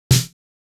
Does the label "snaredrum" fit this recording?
Yes